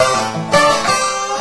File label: dobro danger; music; Left 4 Dead 2; Source Engine; zombat